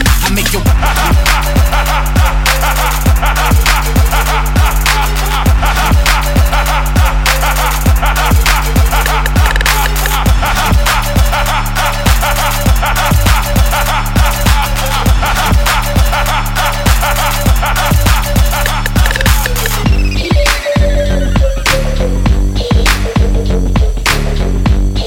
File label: trap